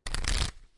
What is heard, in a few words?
shuffle; manic game